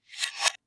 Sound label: Reverse Spell; SoundsCrate